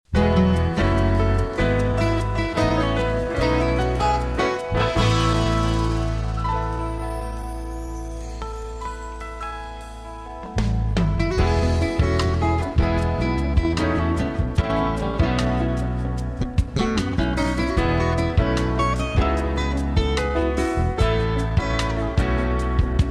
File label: Light Jazz